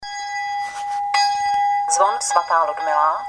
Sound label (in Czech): betonova kaplicka, zvon; osek nad becvou; sv. Ludmila